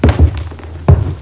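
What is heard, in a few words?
WALK HEAVY; MOVEMENT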